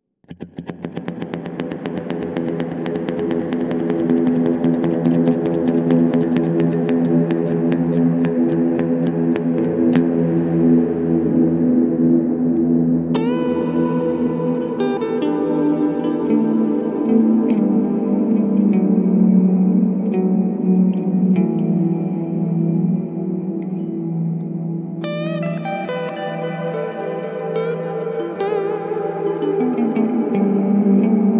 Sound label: Ambient Haze; Walrus Audio Fundamental